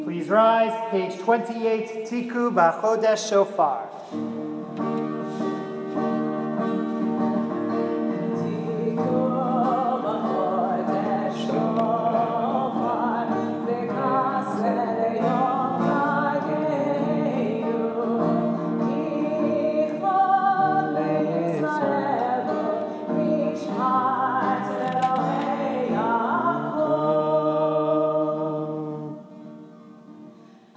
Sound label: Shofar; Rosh Hashanah Evening; Tiku Vachodesh